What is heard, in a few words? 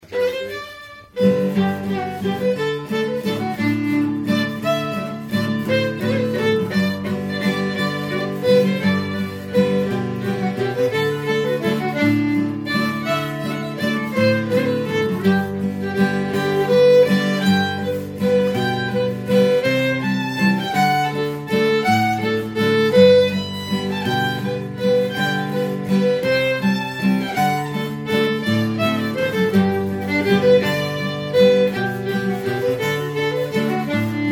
Waltz